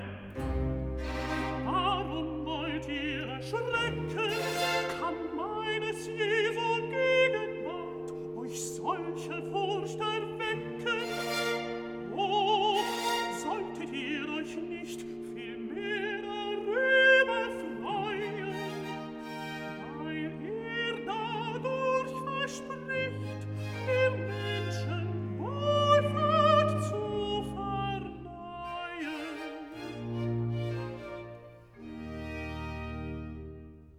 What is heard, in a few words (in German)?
Recitative, alto; Warum wollt ihr erschrecken